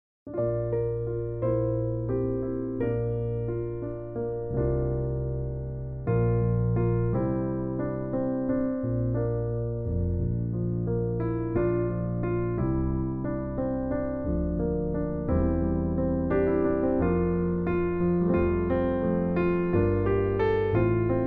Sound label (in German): Pianobegleitung